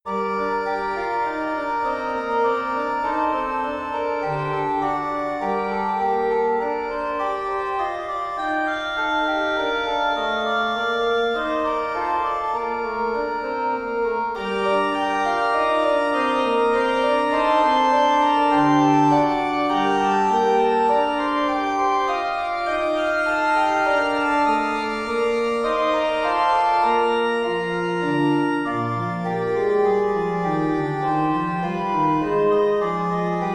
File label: Organ Trio in B Flat Major